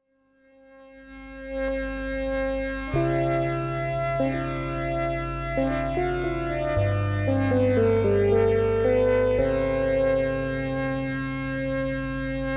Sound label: instrumental